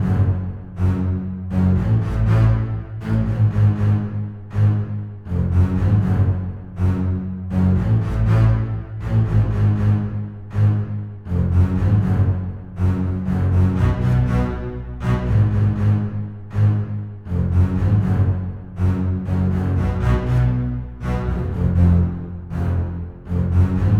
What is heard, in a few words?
Bass String